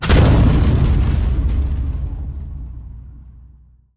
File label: building landed boom; buildings general